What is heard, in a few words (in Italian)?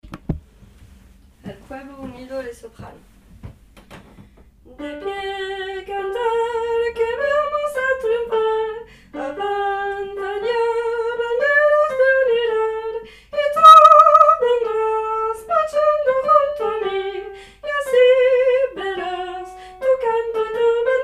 soprani